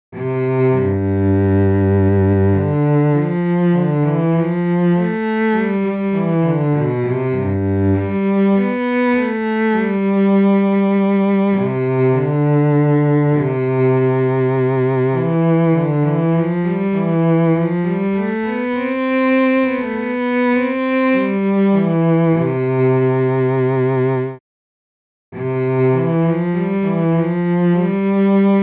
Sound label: cello; mamaia